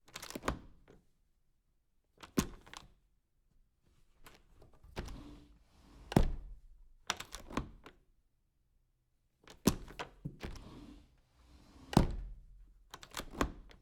sliding glass door latching

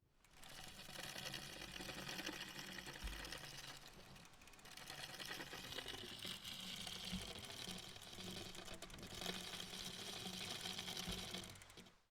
bicycle pedal grind

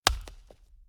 wooden bat hits baseball run

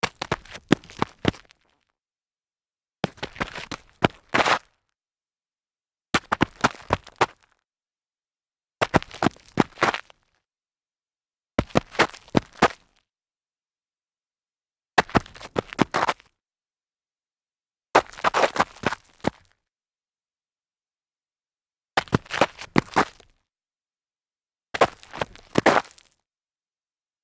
sneaker mount stumble rough grit, foley

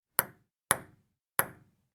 ping pong ball hits table

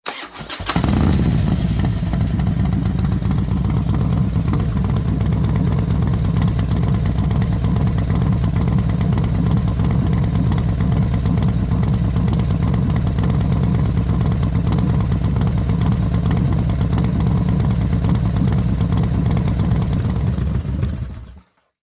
motorcycle engine start idle kill

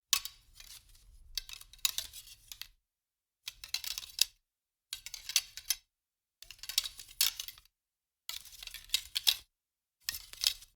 metal arrow handling

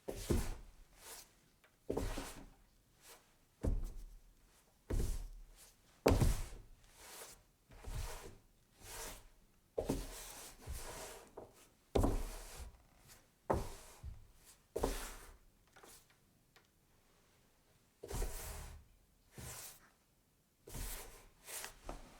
arm movement on desk, foley

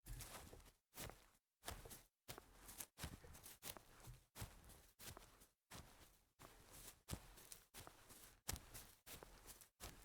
sneaker on grass series slow

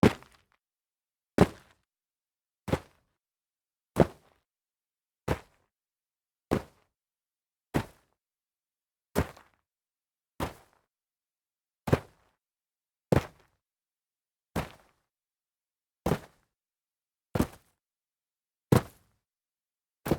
jumping on dirt

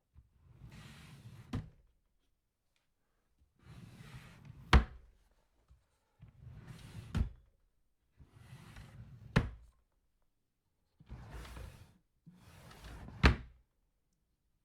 drawer open close

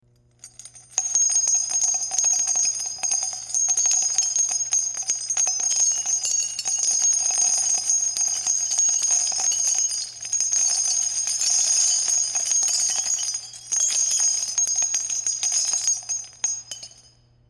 50 cal shells drop

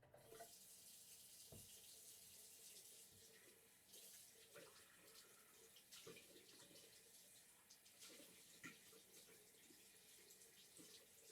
washing hands in bathroom

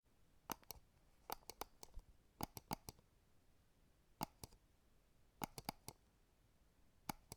click continuous

